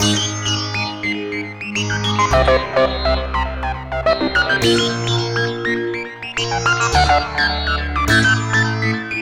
Synth Pattern; Electrocution

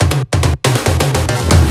Fills, Loops 140 BPM

